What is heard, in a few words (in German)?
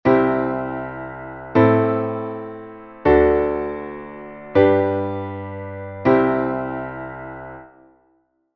Akkordfolge Zwischendominante